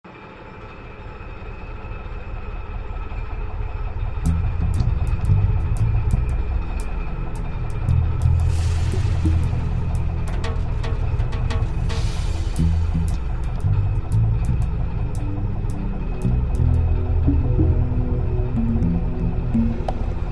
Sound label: active machinery room; mi4